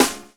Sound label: SD FUNK, SNARES; E-Lab; X Static Goldmine 2